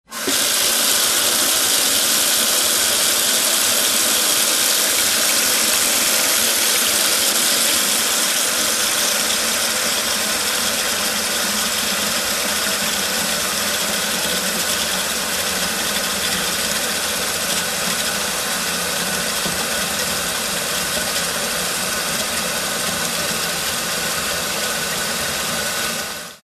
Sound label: Water flows into a porcelain sink